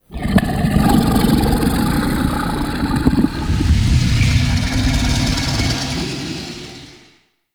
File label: Inhale; Enemies; Sleep; Warframe; Infested; Pit Monster